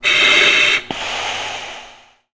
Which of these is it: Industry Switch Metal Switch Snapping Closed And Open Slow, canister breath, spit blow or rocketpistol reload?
canister breath